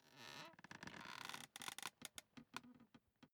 office chair creak sit up

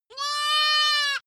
goat baa lamb classic

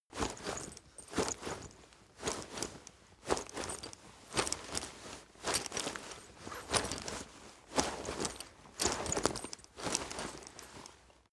leather metal walk slow sequence

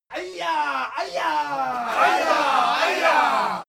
native american shout

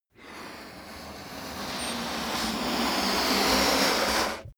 balloon blow swell

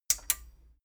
metal button stereo click enable medium

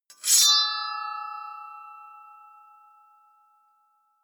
sword wield ringing bright

